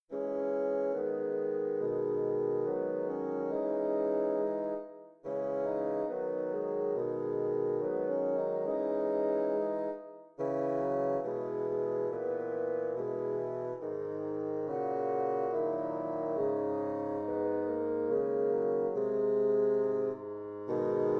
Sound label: minuet bsn quartet; ravel